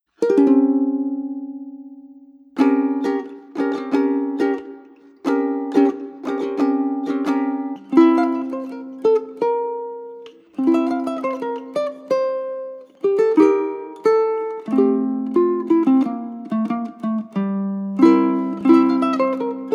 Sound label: Desert BGM, ukulele